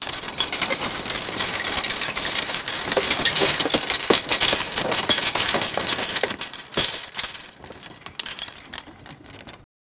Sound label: noise of metal on, conveyor